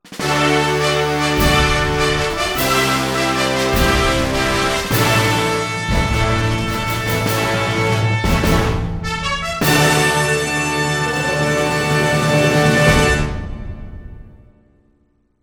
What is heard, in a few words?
Fanfare Usa; Champion Jockey: G1 Jockey & Gallop Racer; Xbox 360